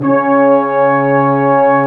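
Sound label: brass section, large ensemble long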